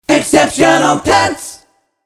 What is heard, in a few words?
Vocal, acapella jingles; Exceptional Pets